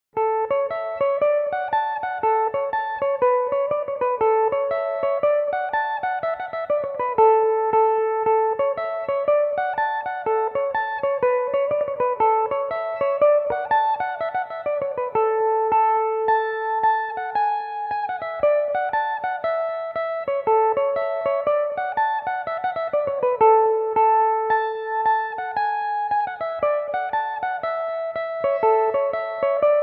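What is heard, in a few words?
slide A major, Irish mandolin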